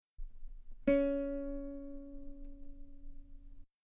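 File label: guitar C sharp 3 very long forte normal; bananenkeyboard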